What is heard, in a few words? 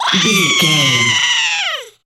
goblin fairy battle shout